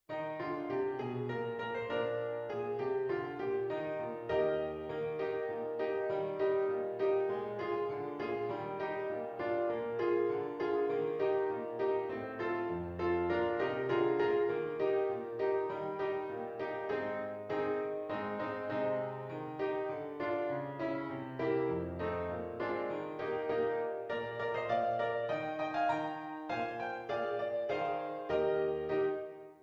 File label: trumpet piano